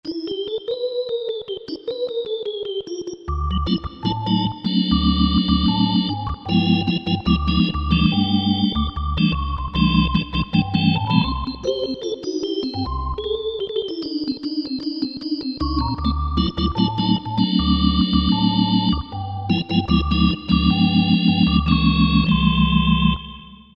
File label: Sixteen One, Yamaha PSR-3000 organ flutes; Factory Voices